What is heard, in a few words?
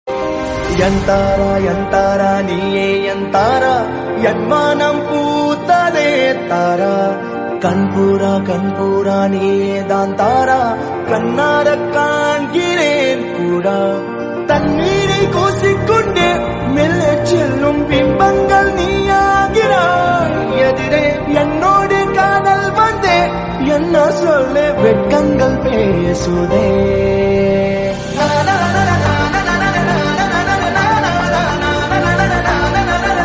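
song love background music songs; tamil song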